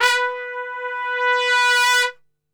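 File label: B 2 trumpet, Brass Super Section; Best Service; TRP SWL; 90 s Sample CDs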